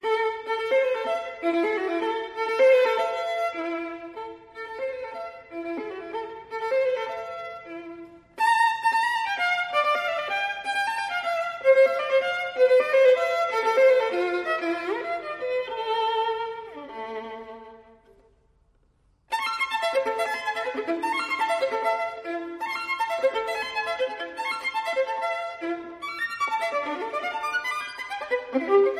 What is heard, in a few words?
classical caprice ringtone; paganini